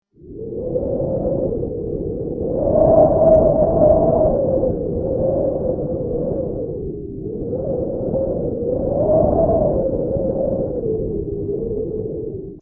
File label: eerie wind; dragon studio